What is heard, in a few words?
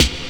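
snare processed, Disco House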